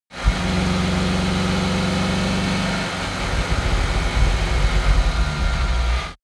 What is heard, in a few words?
car dacia driving slow down